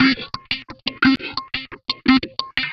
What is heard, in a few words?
Pluki Mono, Leads And Riffs; Sonic Foundry (Sony Creative Software); Synchro Funk